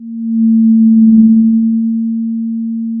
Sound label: Sound of a cruise ship horn